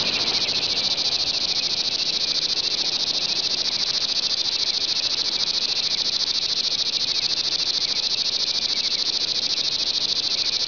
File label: Crickets